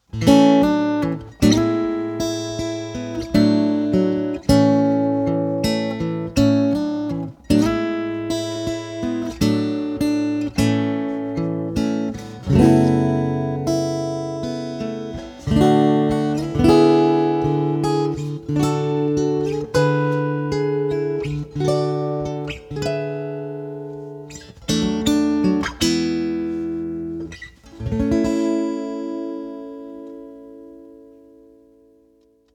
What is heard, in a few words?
baton rouge fjec cherry